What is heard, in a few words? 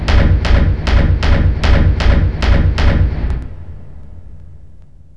weapon